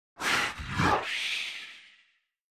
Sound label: Beast Says Yes Evil, monsters